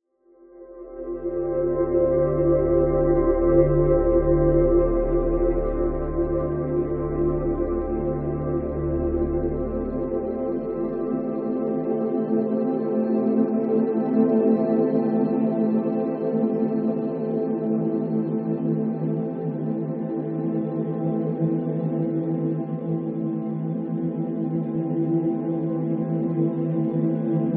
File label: drone; compressed